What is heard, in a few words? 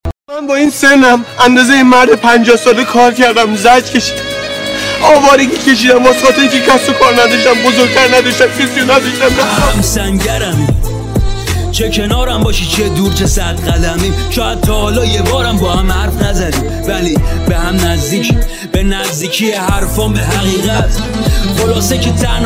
remix rap farsi